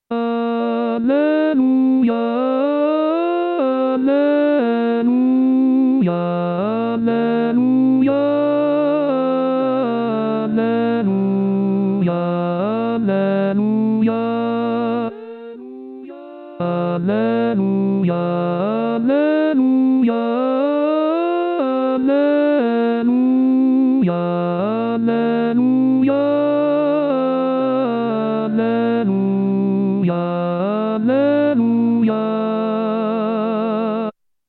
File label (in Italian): Alleluia tenori; Gabrieli; anno liturgico